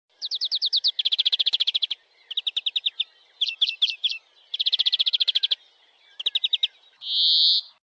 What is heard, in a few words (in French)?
Verdier